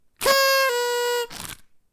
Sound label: party